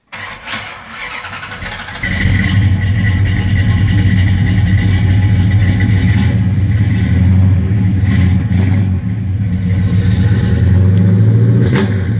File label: Dodge Charger 440 Flowmaster Exhaust